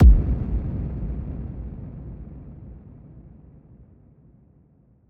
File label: Sub Impact; exciplex sample presets pack; JinoBeats